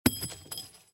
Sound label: shells 20mm ground